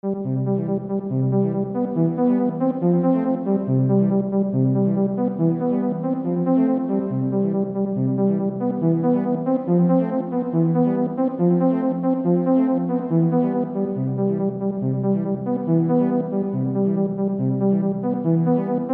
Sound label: Happy tune